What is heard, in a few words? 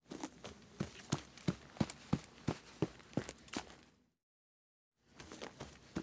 Boots run by grass EXT